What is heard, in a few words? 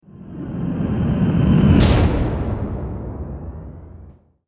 riser hit sfx